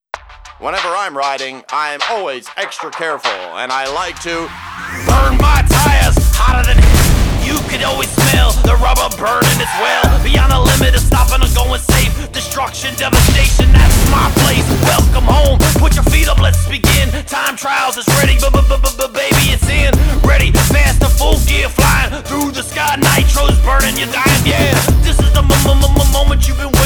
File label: Loader Rap; Trials Evolution Gold